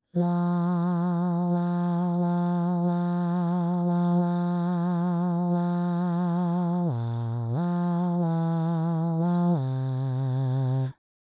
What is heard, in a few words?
Bass